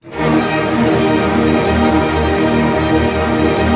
STR ARP MA, Symphony Orchestra, STR Arpegg. Major; Roland LCDP08 Symphony Orchestra; STR Arpeggios

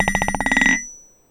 Small Anvil, contact mic, Metalics

